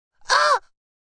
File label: Wimpy Ah, A wimpy; Screaming